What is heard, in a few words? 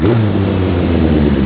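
engine brake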